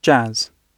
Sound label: jazz